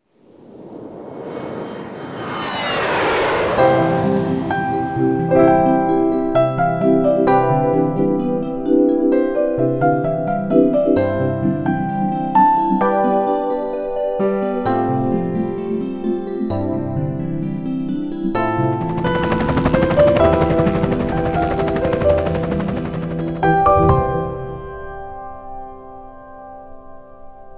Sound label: Piano; DCAA Piano